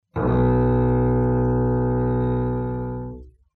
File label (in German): leeres h gestrichen